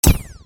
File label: Laser over